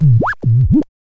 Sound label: scratch kit, vinyl